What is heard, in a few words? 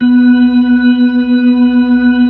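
B-3, Hammond B-3 organ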